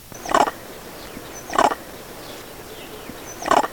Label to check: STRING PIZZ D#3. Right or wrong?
Wrong